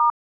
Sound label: Key Asterisk, Key Tone Beep; Samsung; GT-S8600 Wave III